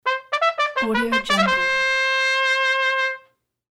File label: spanish ole trumpet